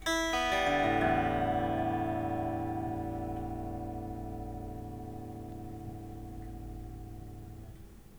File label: guitar; クリーンギター; エレキギターのクリーンの音(立体音響)